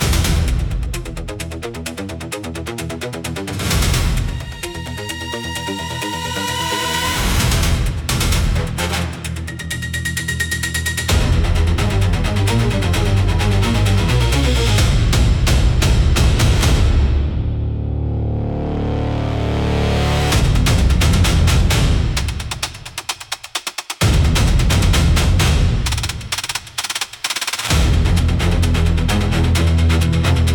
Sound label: Burning Rubber Run, high tension suspense